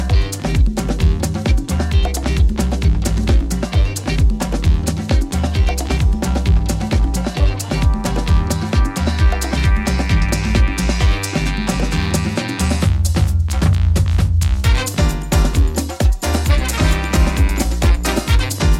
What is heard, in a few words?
Remix Instrumental